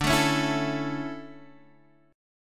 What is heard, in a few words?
D Major 7th Suspended 2nd Guitar Standard, chord; strummed